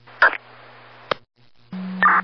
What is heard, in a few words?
Radio Click Noise